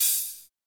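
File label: hi hat; Best Service Gigapack 2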